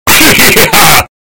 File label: hehehehaww